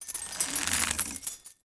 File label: chandelier Swing; objects; Star Wars Obi-Wan; XBOX